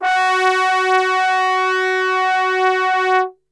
F#2 Brass Super Section; Best Service; TRMBSTRGHT; 90 s Sample CDs

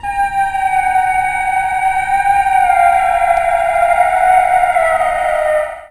Space; Electronic Point Blank

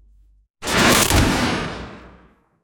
wind impact

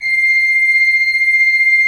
Cathedral Organ, HOLZGEDACKT, C6; Propeller Island

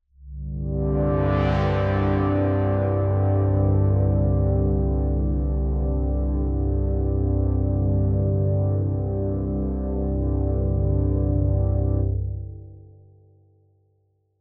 One Shot Drone C, dystopian drone; One-Shot Drones

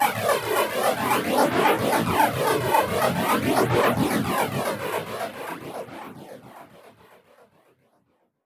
Modu Proc, alarm siren, 110bpm; musicradar

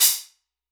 Crisp Hot Sting, Hats; Airwindows

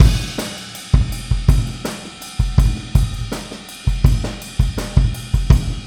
Boogaloo Chorus Kick, drums, 82 BPM; Drums from the Big Room The Mixes

